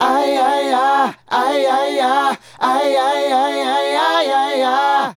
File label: Male Group, Hip-Hop and RnB Vocals